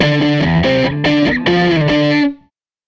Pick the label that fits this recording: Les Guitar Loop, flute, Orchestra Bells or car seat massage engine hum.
Les Guitar Loop